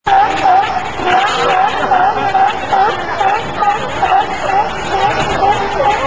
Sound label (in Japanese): asika; 着信音やアラーム（目覚まし）音に使える、着モーションとして; FOMA